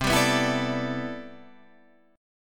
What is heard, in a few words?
C Major 13th C, Guitar DADGAD